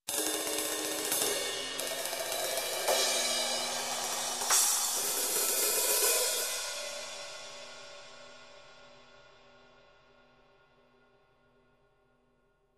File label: Various Tension Cymbal Swells